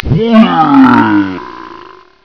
BIG YAWN; VOICES

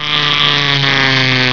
GRINDER, MACHINES

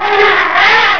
ELEPHANT; ANIMALS

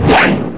WHOOSH

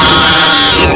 ALIEN; ANIMALS

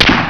impact

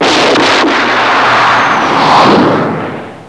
FIREWORK

